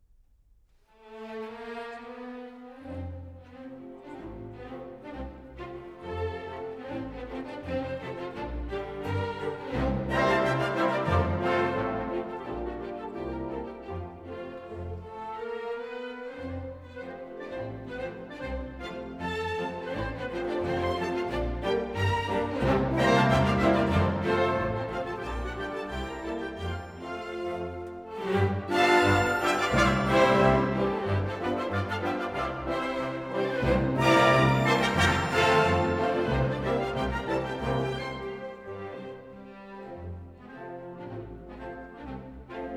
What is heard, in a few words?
Hungarian Dances for orchestra, F major; Brahms